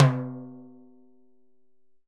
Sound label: Low timbale